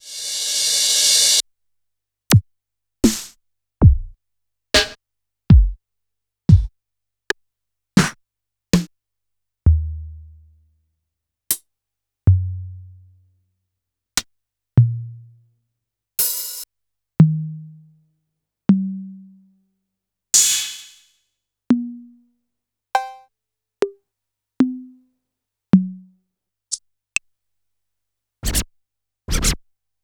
Yamaha PSR-E323 Dance Kit

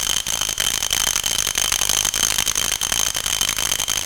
ELECTROCUT; E-MU Formula 4000 Series Vol. 3; Analog Odyssey

